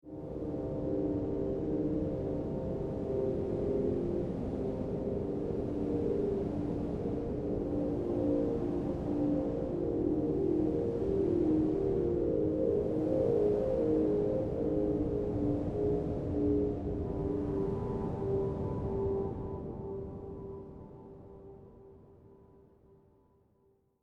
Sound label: Ice Desert Pad